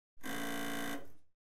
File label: Bus - Interior - buzzer rung once; Cities; The BBC Sound Effects Library